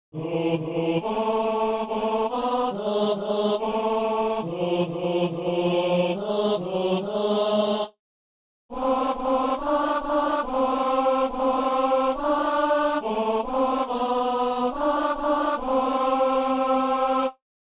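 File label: tenor; maintenant seigneur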